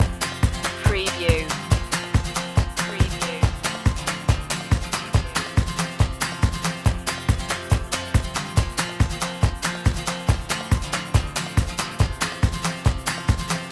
upbeat country fun loop

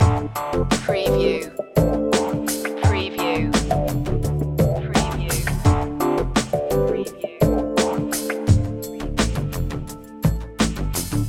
rising tech loop

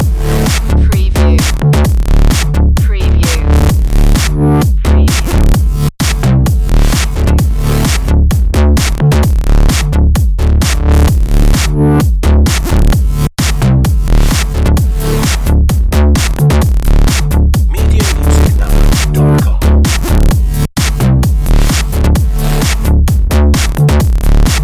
block party loop

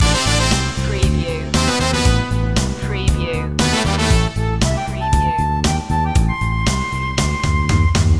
spy lounge loop

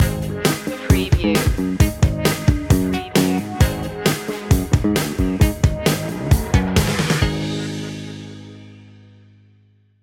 sexy girl stinger

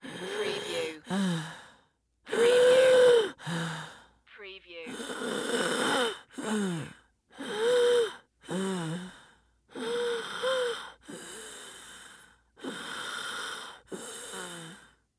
Breath Wheeze Long; sound-effects-bible

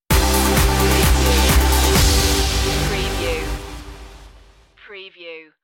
forward motion stinger

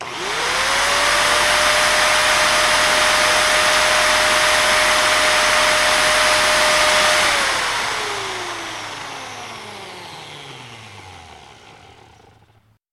Orbital Sander Long

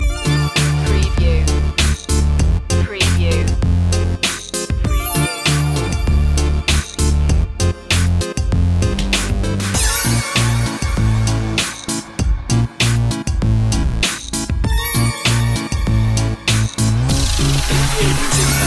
squeezer loop

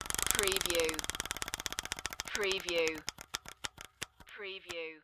Paper Hitting Wheel Spokes; Wheel of Fortune

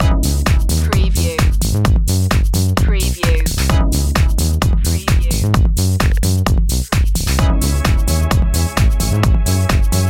pump steady groove loop

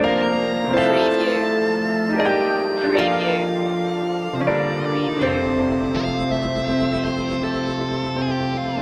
fantasy waltz loop

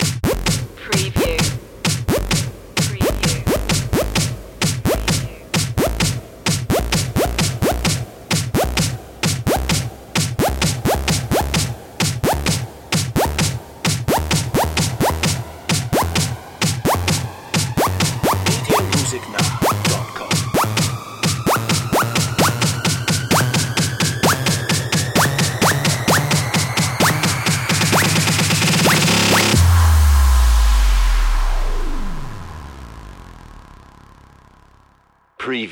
dutch influence long riser

